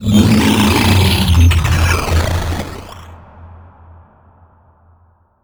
luteboss cry; Crypt of the NecroDancer

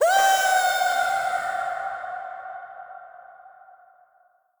death; Crypt of the NecroDancer

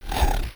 wallmonster chase; Crypt of the NecroDancer

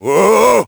ice slip; Crypt of the NecroDancer

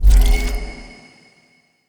shrine sacrifice; Crypt of the NecroDancer

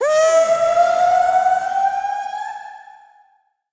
trapdoor; Crypt of the NecroDancer

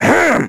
dorian hammer; Crypt of the NecroDancer

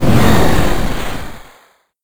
dragon death; Crypt of the NecroDancer

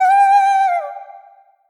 blues queen sing; Crypt of the NecroDancer

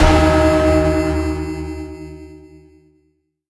deadringer bell strike; Crypt of the NecroDancer

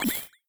pickup gold; Crypt of the NecroDancer

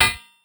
hammer; hephaestus; Crypt of the NecroDancer

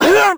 squish; Crypt of the NecroDancer

